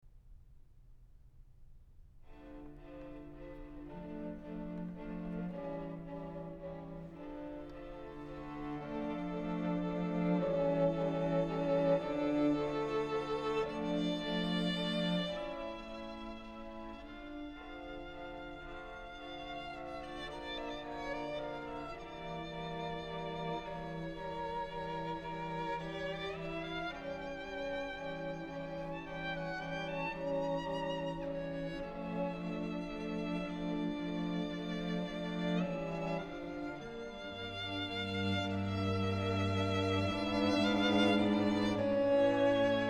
String Quartet No. 1 in F Major, Adagio affettuoso ed appass; Adagio affettuoso ed appassionato; Ludwig van Beethoven; Beethoven - String Quartets No. 1-6, Op. 18